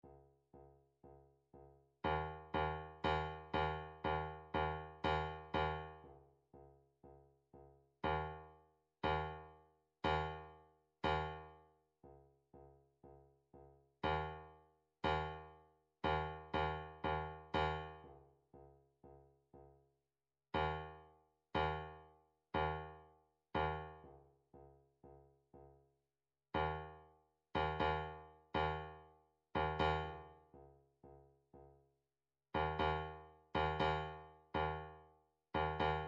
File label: drumtrax toolbox clap